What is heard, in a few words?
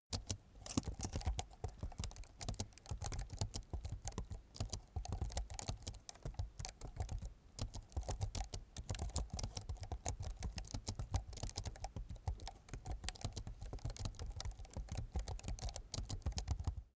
keyboard laptop type sequence soft